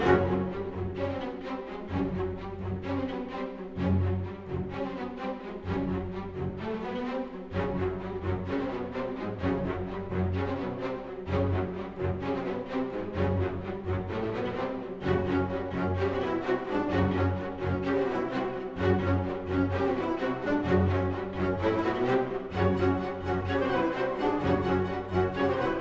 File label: Danger 64bpm Loop; asterix obelix slap them all 2; Music Dialogue